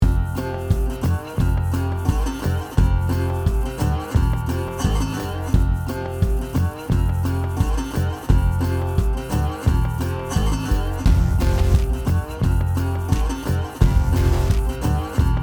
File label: beat Harmonica